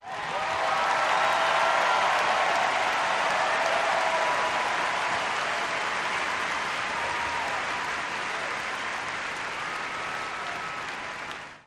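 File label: Crowd 50000 Whistle, large int; Crowd ( 50, 000 ): with Whistles / Applause, Surge / Drum In Background. Cheers Crowd, Huge.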